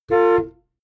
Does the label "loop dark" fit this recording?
No